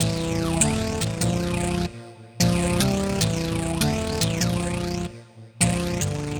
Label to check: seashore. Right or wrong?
Wrong